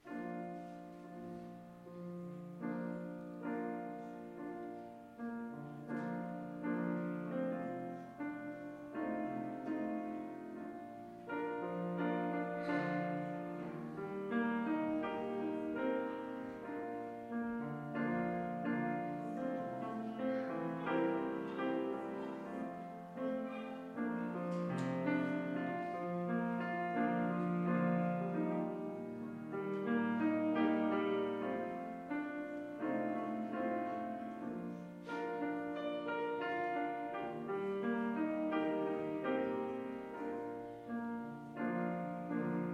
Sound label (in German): Vorspiel